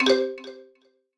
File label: PUZZLE Success Xylophone Three Note Delay; stereo; Universal Sound FX